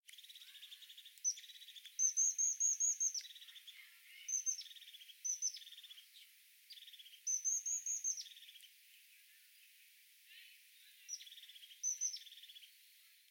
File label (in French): mesange huppee lophophanes cristatus